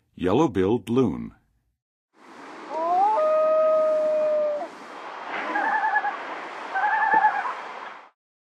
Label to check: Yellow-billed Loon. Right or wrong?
Right